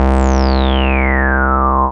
BAS, Eurotech; Sounds Good

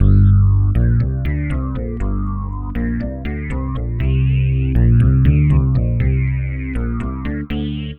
Synth Bass; Bass X

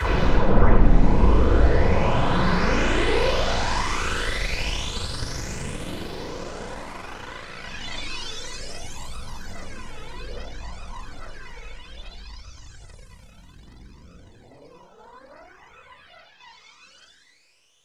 synth fx; Zero G; Malice In Wonderland

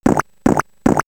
Elec FX Computer Game; X-Static Goldmine

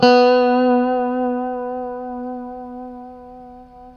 B JC120; Guitars; Spectrasonics; Hans Zimmer Guitars VOL-1; JC-STRAT MF

